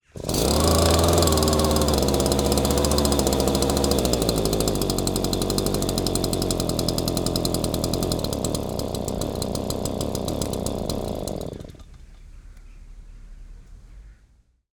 leaf blower engine ext cold start off side perspective stereo xy; fvtt sonniss gdc audio